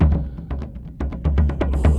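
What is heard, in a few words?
Music Shield; from dust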